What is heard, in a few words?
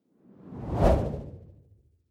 whoosh impact soft wobble low